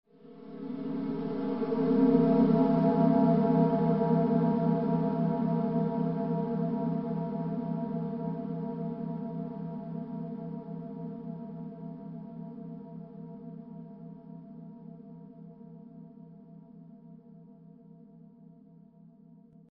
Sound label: suspens